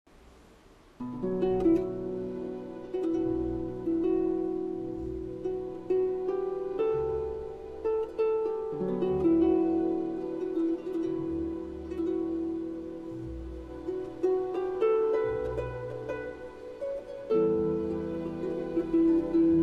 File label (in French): harpe; pincees